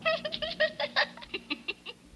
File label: laugh